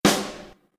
snare

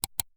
click

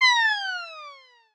fall

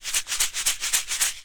shake

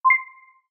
button

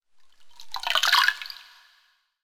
water

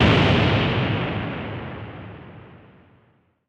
burst

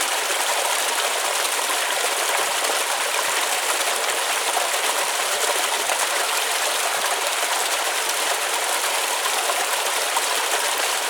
stream, nature

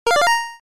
button

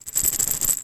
med bottle

